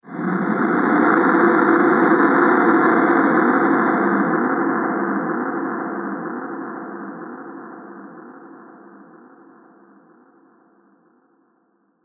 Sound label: Explosion Lofi With Fallout; Explosion 2 - Lofi With Fallout; Bomb Sound Effects; Impact / Explosion Sound Effect